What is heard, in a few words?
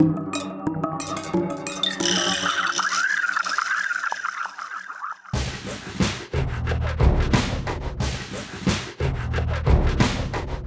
Perc